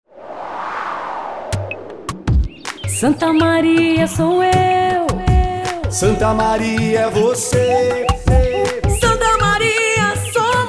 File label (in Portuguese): jingle sem aplauso; RBS; SMSN